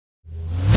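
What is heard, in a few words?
clack reverse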